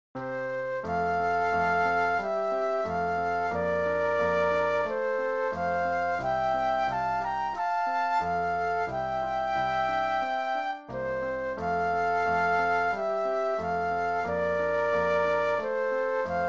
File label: Flute Duet and Piano; classical